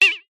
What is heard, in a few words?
cartoon spring small ping twang